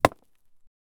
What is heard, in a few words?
wood axe chopping into